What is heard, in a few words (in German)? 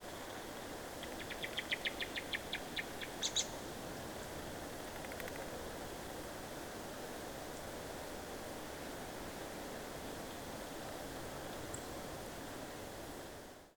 singdrossel warn im flug